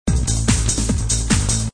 Loop; Chaos Faction

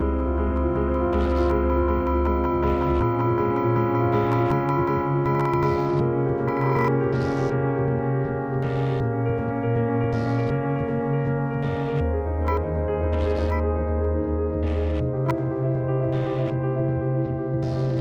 Waterworks Murky Slow; rive wreck hack die retry; ps4

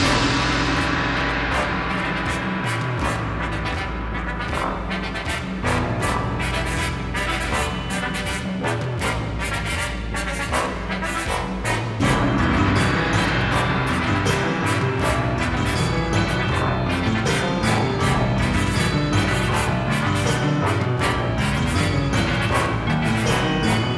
music boss giant frog

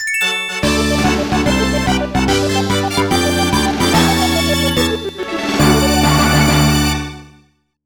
Jingle Winner; Daredemo Asobi Taizen

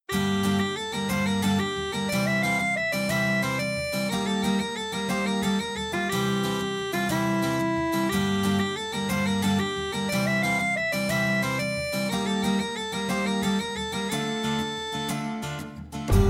Hurdy-Gurdy melody; Pirates Outlaws

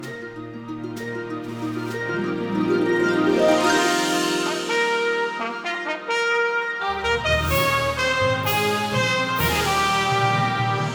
Music Menu Title Screen Intro And Loop

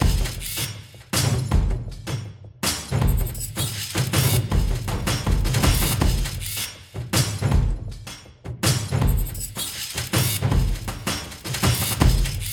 Percussion Loop Fast Version; Dual Blade Battle of the Female Ninja

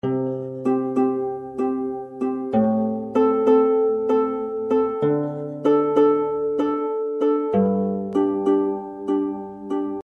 Music Gomoku; GamePigeon

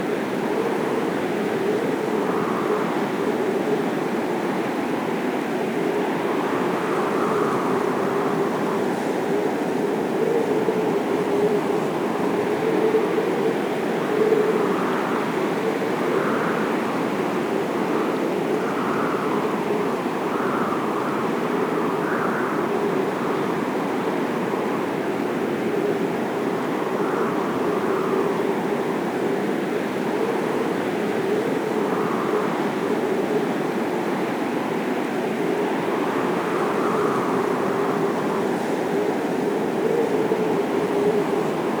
Secret Mine Outdoor Wind

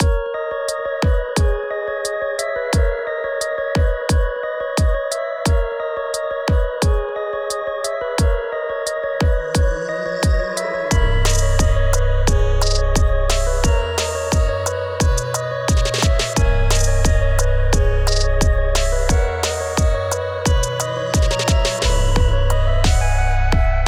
(Spooky Mix) (Instrumental)

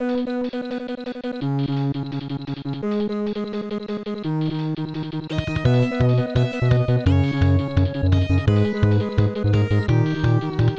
Boss Battle

